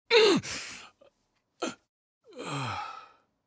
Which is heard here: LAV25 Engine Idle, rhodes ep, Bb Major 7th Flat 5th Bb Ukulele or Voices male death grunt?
Voices male death grunt